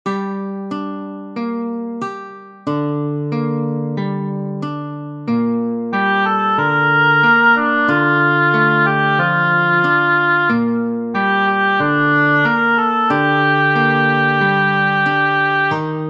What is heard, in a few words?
oboe